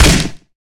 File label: huge log hit; HeavenStudio; lumbearjack